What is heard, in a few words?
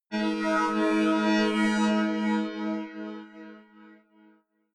sci-fi galaxy rising ring